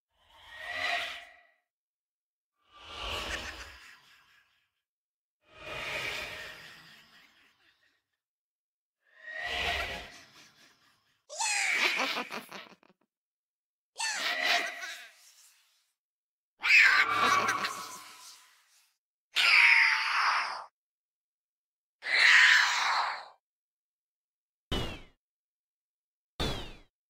Minecraft Vex

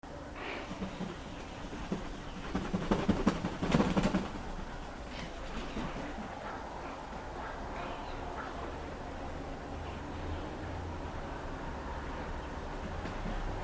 Northern bald ibis; پرنده اکراس سرتاس شمالی

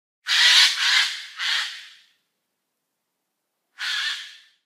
Eurasian jay; جیجاق

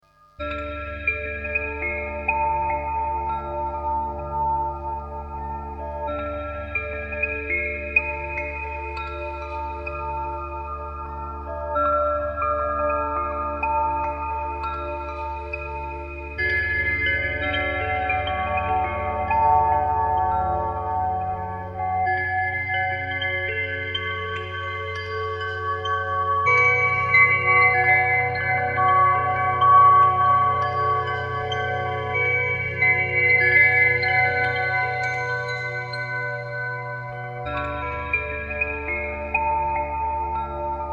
little secrets fairy tale sound

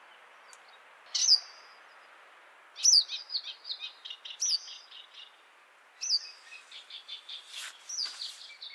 Cape wagtail

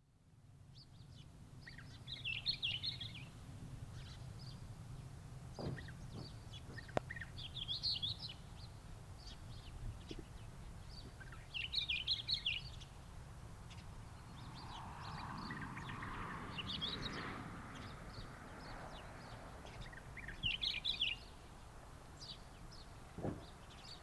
Hume's wheatear

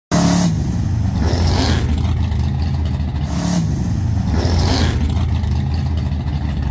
oldsmobile; آمریکایی; الدزمبیل آمریکایی

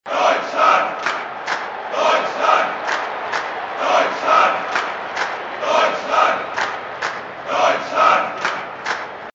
encouragement ringtone

